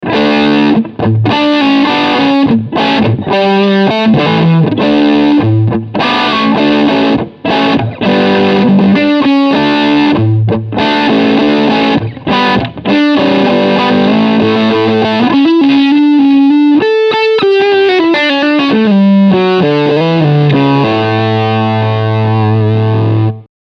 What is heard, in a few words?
Distortion Medium Gain